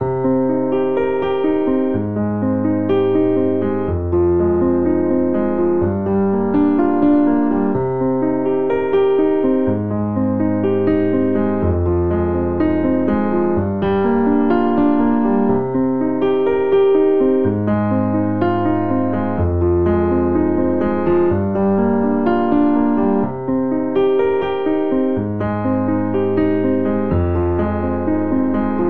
Progression Arpeggiated Pop Ballad i - bVI - iv - v C Minor 62 bpm, Grand Piano; MIDI Clips; Ableton; Factory Packs